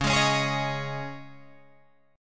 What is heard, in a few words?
C sharp Suspended 2nd C sharp Guitar Drop D, chord; 11,11,11,13,14,11